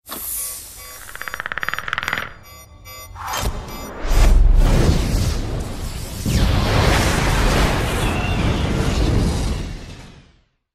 Self Destruct; Avp

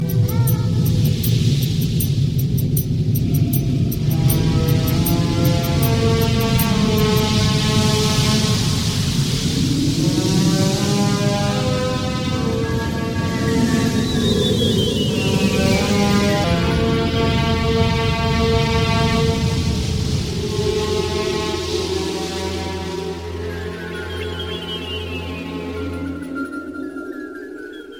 Ufo Landing

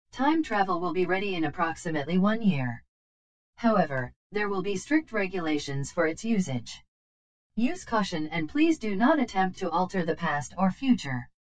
Futuristic; Cortana

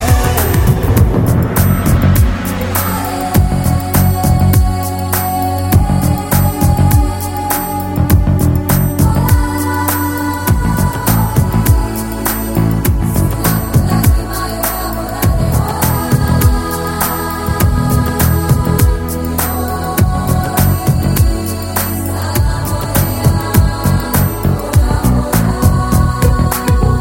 Bass Beeps

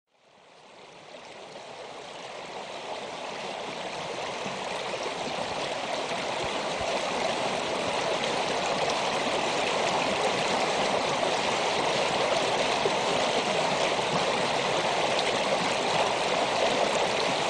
Flowing Stream

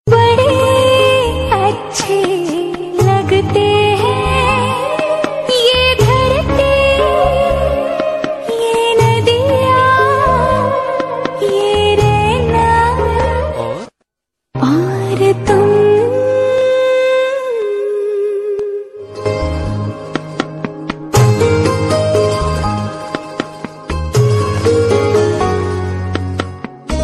Romantic

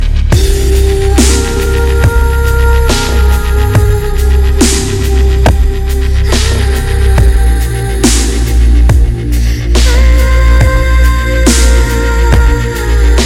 Best Dubstep

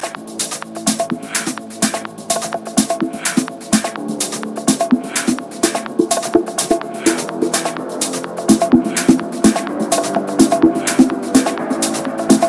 Drumline

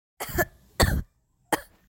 Cough

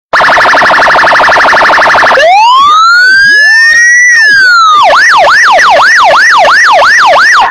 Police Brasil

